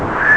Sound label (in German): windpfiff; Amiga Soundtracker Sample Packs